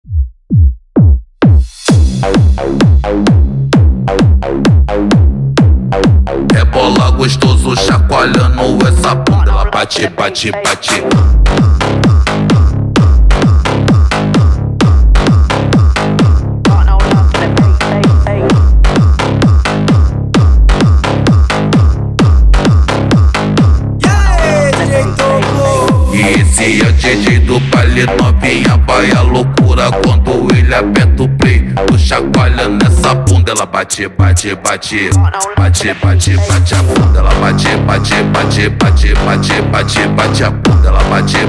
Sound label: Phonk